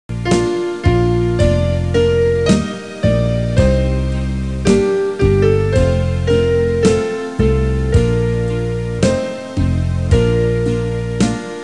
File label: bass